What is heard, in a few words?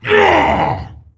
Attack Heavy; Jaraxxus